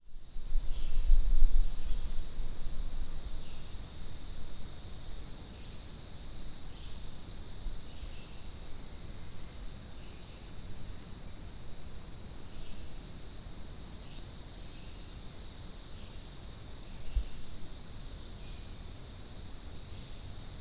Hieroglyphic Cicada chorus; cicadas

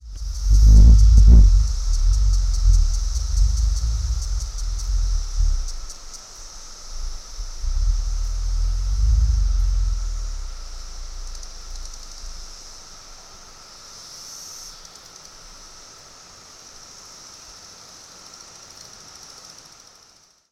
Cassins ticking and pulsating, cicadas; susquehanna wildlife

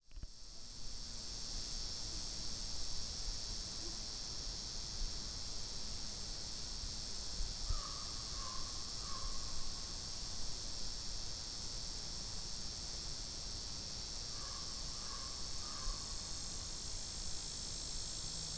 Lyric Cicada, cicadas; Neotibicen tibicen; Swamp Cicada

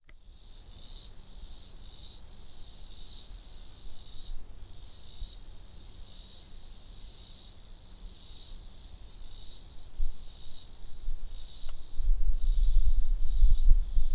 Robinsons Cicada chorus; cicadas; Male Robinson's Cicada Singing; A male Robinson's Cicada sings from a perch in a deciduou

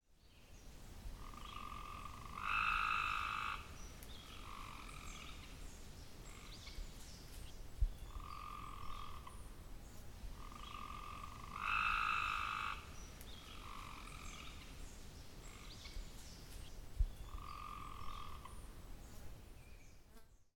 Copes Gray Tree Frog; amphibians

